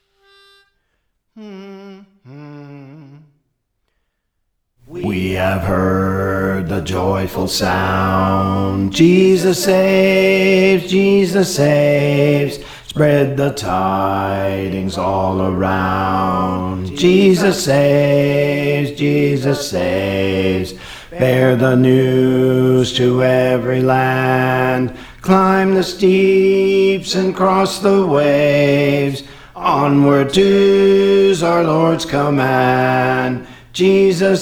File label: Bass; Praise For The Lord Training